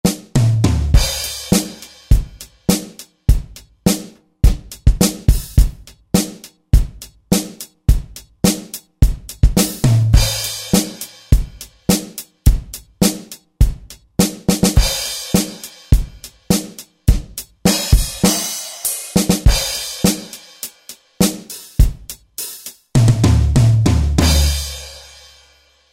Country Drum, Roland SR-JV80-17 Country Collection